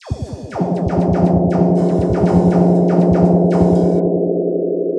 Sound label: drummix